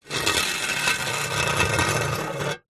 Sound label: industrial shovel spade metal drag scrape concrete; zapsplat